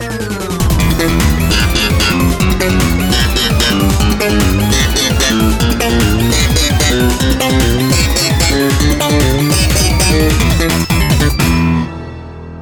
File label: PUNK PIZZA BASS; Totino's Mania